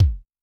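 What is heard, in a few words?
bass drum dry, Kick, Yamaha DTXpress-II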